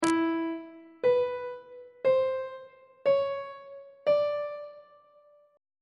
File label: e 6th interval pitches on treble clef